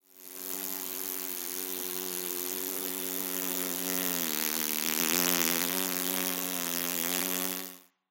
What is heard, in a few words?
amb flies buzzing; Half Life Alyx